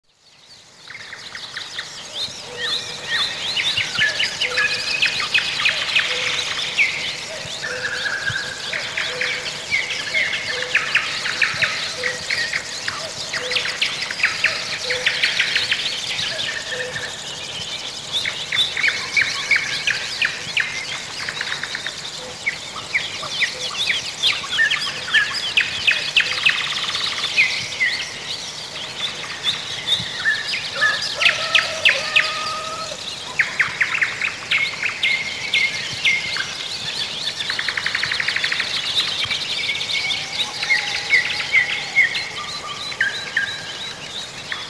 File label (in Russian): golosa ptic